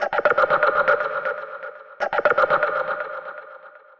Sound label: Rhythmic (120BPM); musicradar